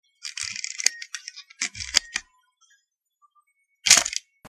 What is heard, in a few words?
midelly